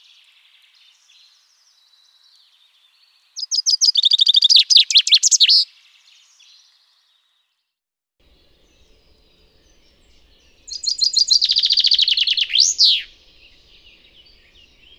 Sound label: fringilla coelebs, bird